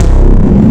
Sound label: DICKES DING, TWAV BASS; Soundengine - CSR-000 The Art of Transwaves